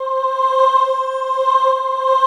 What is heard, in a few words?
PAD